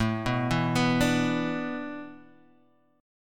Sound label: A Suspended 2nd Suspended 4th, Guitar, Half Step Down